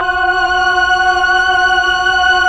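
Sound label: Pad Tron Violin & Choir F#6; Tron Str+Vox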